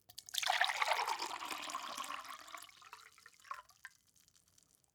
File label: glass pour hard; soundpool